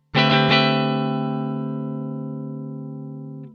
sus13; fretjam

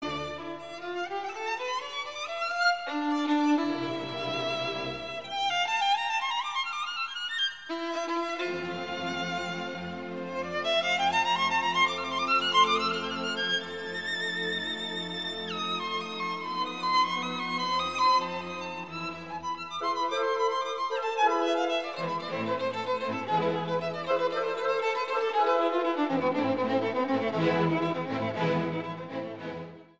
concerto for violin and orchestra; beethoven; mvt1

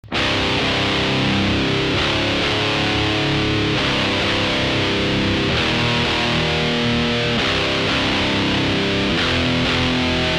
death scale; fretjam

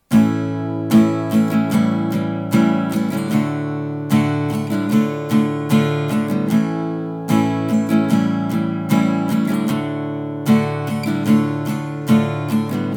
tonic; fretjam

